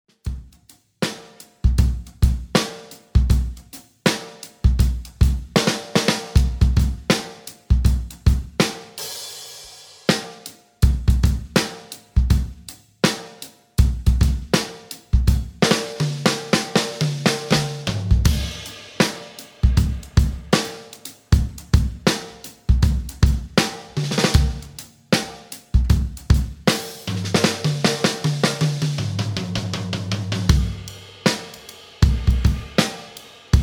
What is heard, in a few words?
big beat natural hi tuning shuffle, drums